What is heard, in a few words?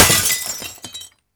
window break